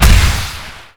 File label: Rocket, horns; ShootMania; trackmania